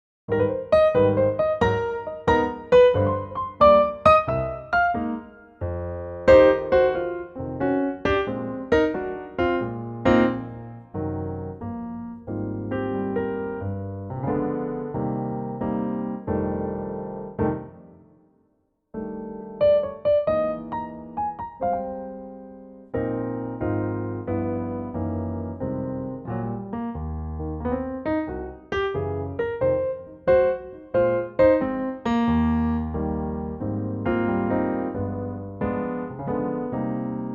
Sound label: Eb piano